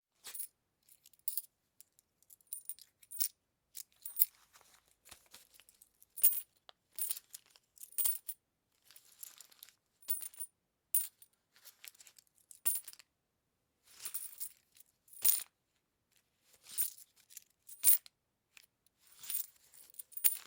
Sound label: coins shuffling